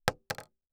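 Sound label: Weapon Bullet Shell 16 gauge Drop On Trunk; AdobeCC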